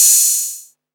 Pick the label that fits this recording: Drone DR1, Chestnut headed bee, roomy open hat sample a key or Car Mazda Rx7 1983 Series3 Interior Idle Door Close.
roomy open hat sample a key